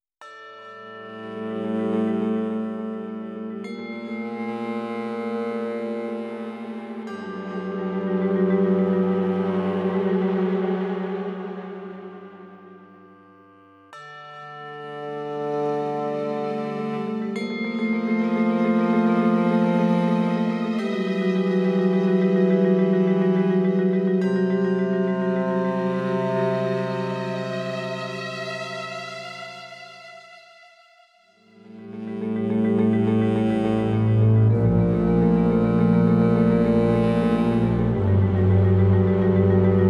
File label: Dark Cave; Eternal Dread